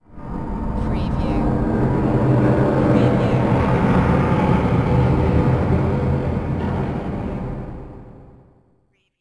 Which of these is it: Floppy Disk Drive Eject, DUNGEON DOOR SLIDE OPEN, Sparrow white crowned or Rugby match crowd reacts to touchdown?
DUNGEON DOOR SLIDE OPEN